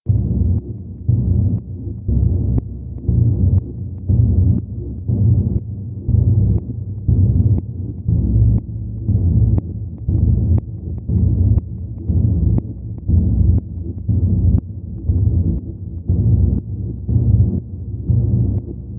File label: Heartbeat chord